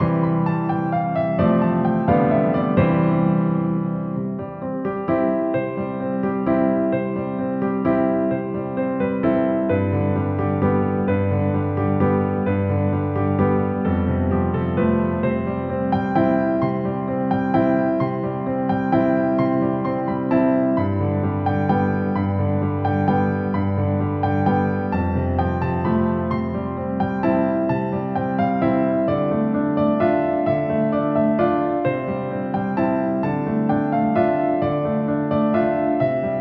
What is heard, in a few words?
piano, sadeh